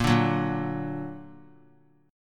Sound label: A sharp Augmented; A#+ strummed; JGuitar